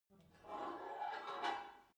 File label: take off weight barbell; sport freizeit